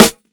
clean snare drum a key, Royality free steel snare drum sound tuned to the A note